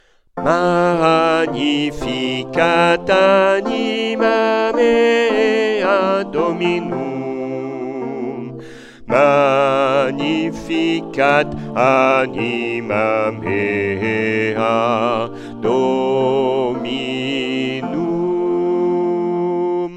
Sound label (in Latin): Magnificat BASSE